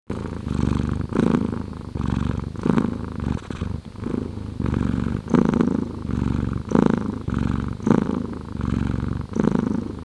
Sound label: cat purr